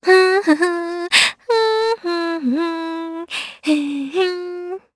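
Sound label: Vox Hum, Japanese; Leo; kingsraid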